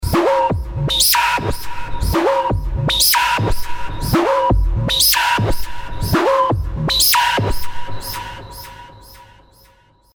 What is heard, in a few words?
Noise filter